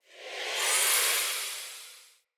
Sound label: Sword Melee Wind Up; Weapons; Player; Swords; Fenn Jotar Sword